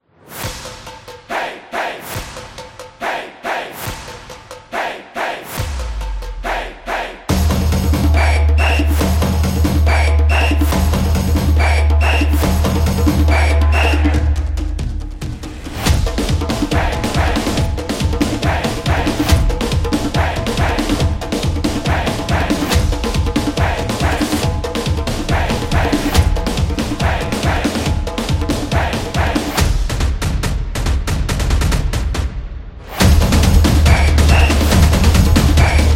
Fast Sport, football music

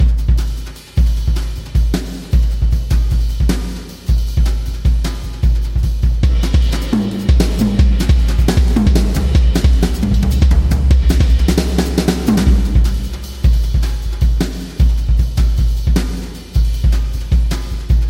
Jazz Drums; jazz club; Birdman; Whiplash

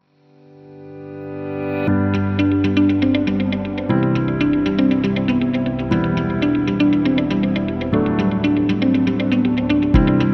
Emotional Corporate

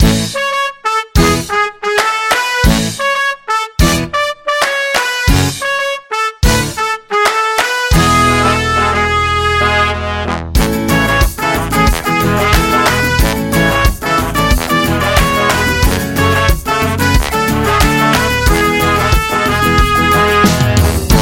Mexican Holiday; sounds of the world

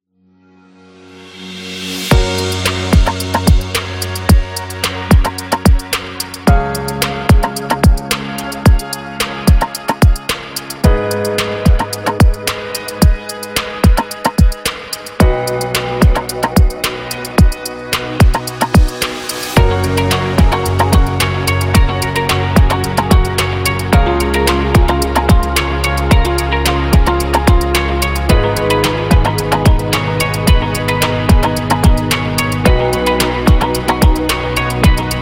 Corporate Hip Hop; business background

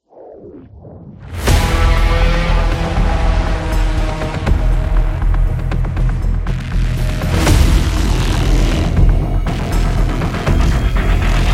Doom Metal Trailer

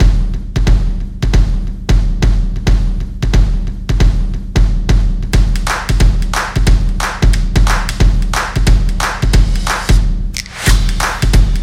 Some Percussion; percussive nation vol 2

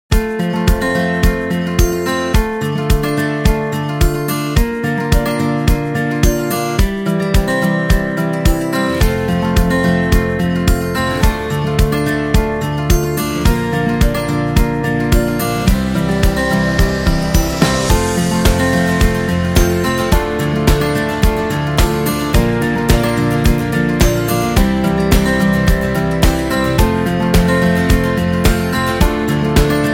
Simple Upbeat

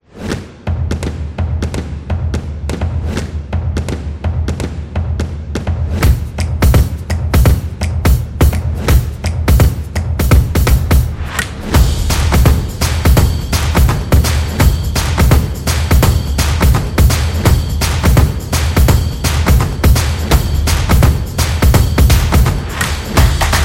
Drums Promo; percussive nation vol 3

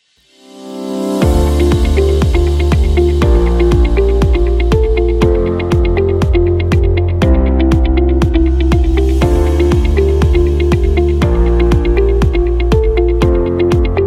Positive Corporate

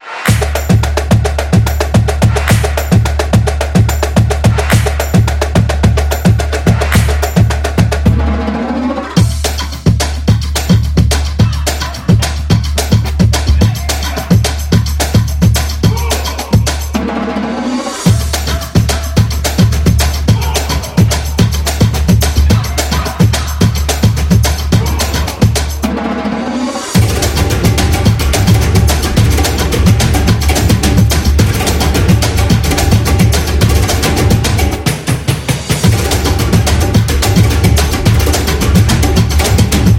Beat Fast; stomp and clap

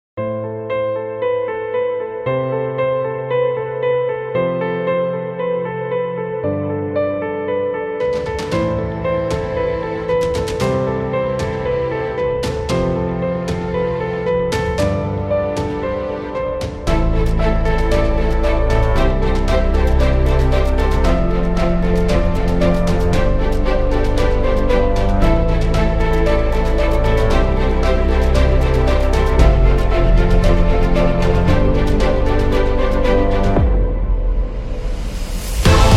Inspiring Powerful Trailer, corporate music; corporate music vol 15

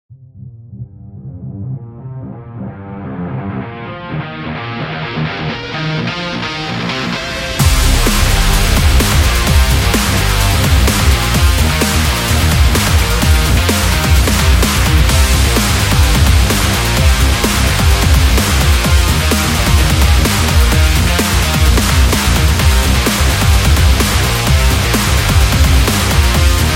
Racing Rock; epic sport metal

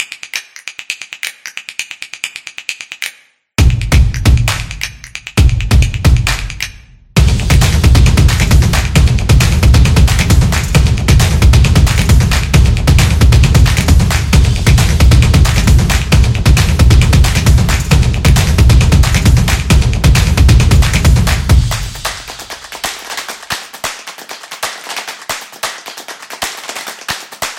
The Drumming Stomp; high energy

